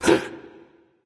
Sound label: nv breath, vox; smite; voicepack; vulcan